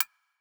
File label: Hihat, Dubstep